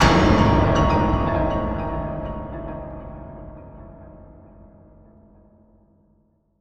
Horror Sting Eyes In The Dark

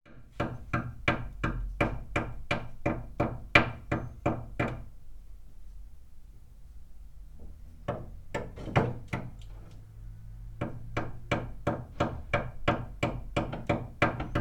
Hammer House

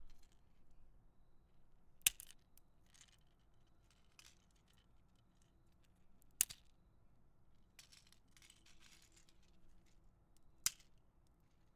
Snapping Twig

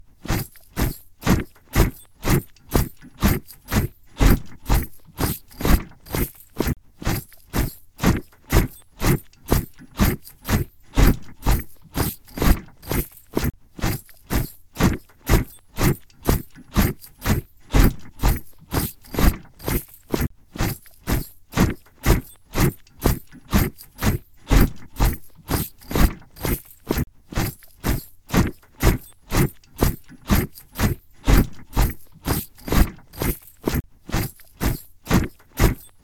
Marching Boots

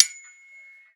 dagger

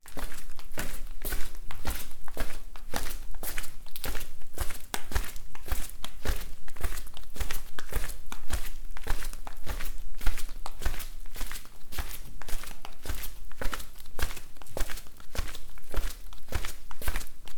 Walking In Flip Flops

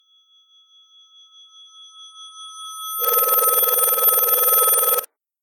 Bell Backwards

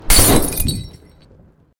Molotov

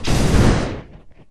Fire Trap

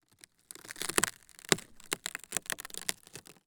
Wooden Debris Field Recording

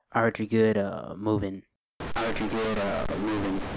Move In Vocal

